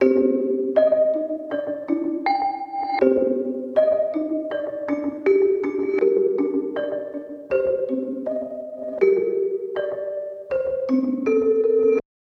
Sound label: melody pluck 80 Dm